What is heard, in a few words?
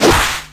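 Battle Claw Critical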